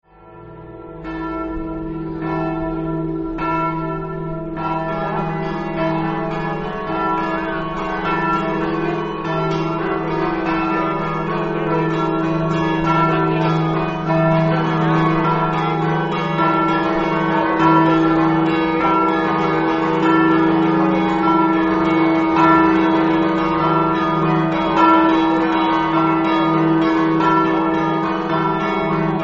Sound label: campane